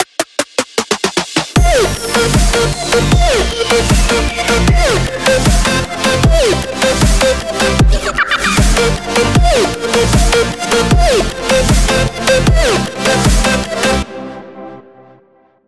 melodic dubstep

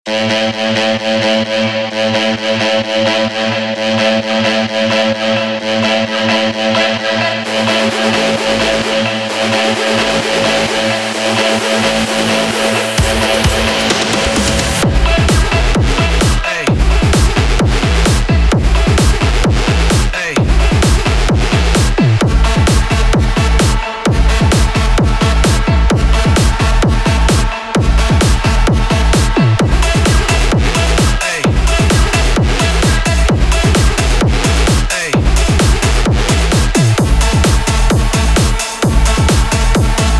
bass house

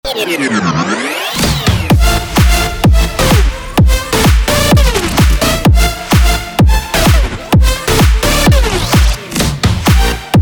future bounce

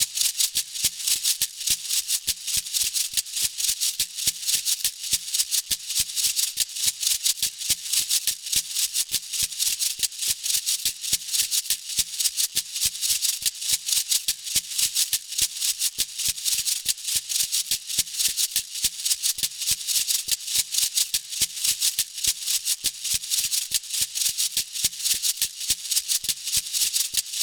perc loop ensemble sekere 3 4 time

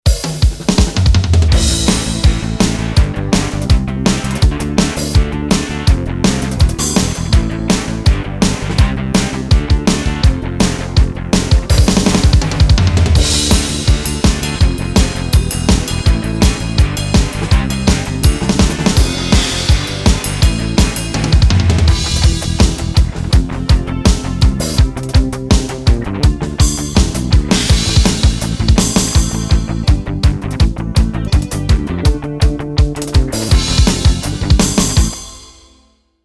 rock yamaha

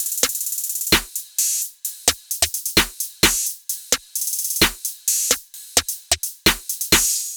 hip hop trap 130bpm tops

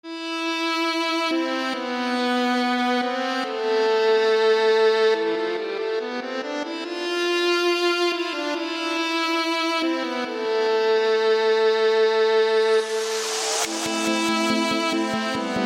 violin trap

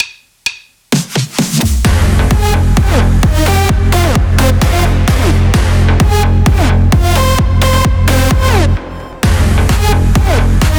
big room

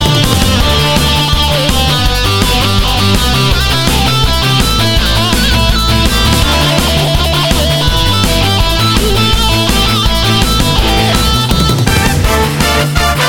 rock

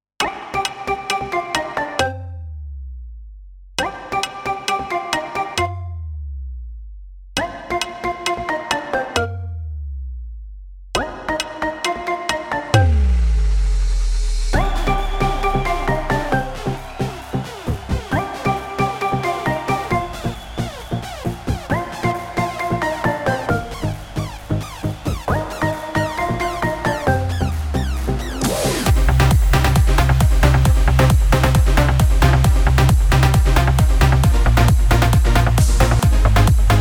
edm

